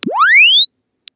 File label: Press Two Sides Chirp; Samsung 4 Recordings